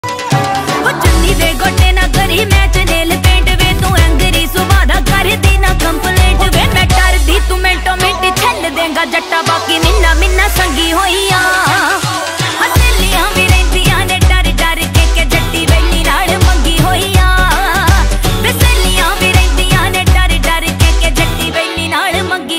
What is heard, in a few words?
ringtone